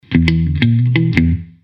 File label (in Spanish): Riff rockero